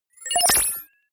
confirm sweep, signale; soundpool

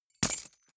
key ring large drop carpet medium; objekte; schluessel

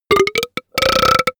monster burp; comedy-cartoon; soundpool

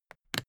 briefcase plastic latch lock close unsteady

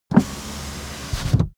car window front close part

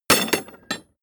metal tools drop; berufe; handwerker; soundpool

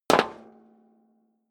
step high heels metal rattle single stair up; schritte

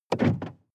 sports car int trunk open medium; verkehr transport; autos

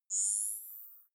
zoom in light

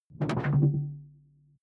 sci-fi alien tech; swooshes-swishes

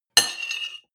cutlery on plate cut squeak short; berufe; soundpool

